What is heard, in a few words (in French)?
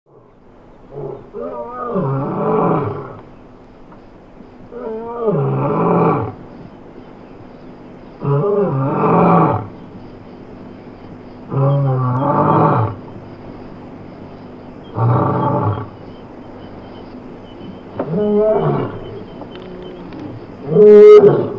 lion